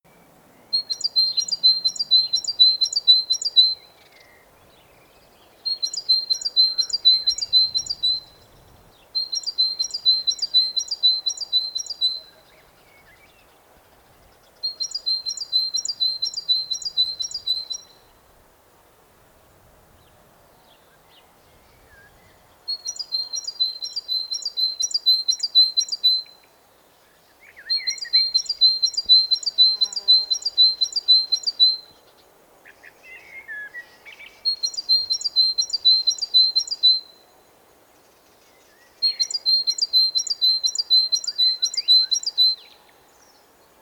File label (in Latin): Parus major; SETE